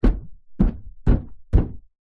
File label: vehicles car bonnet hood hit footstep bang ext